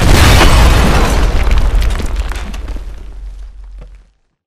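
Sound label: hornet explosion; Titanfall 2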